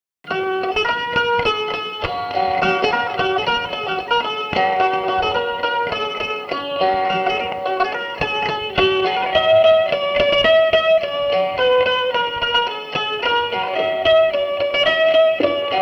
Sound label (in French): polka solo, epinette